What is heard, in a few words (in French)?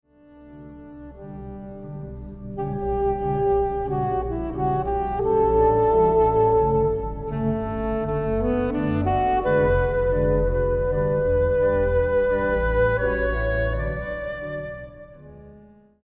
Chanson de nuit; Elgar